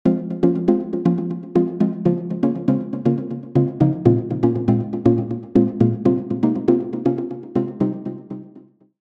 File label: Keys Lush